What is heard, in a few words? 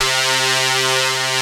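P5 guitar pad C3; VINT.KEYS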